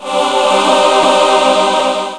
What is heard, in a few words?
Level Completed; BlockForge 1.0.1